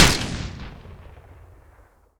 grenade explosion

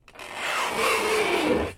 zipper bag

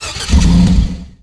engine motorcycle

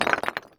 rock fall

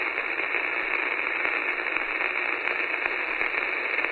static noise fm

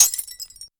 glass break

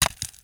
stone fall bounce

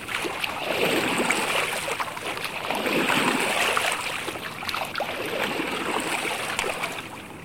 lake waves

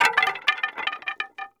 wood drop roll

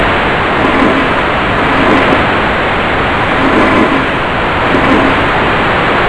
SHOWER

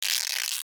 adhesive tape rip

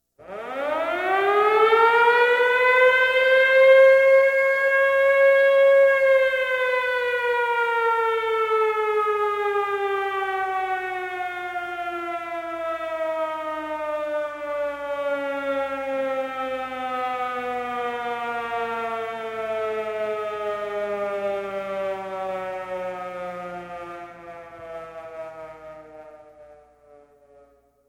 civil defense siren

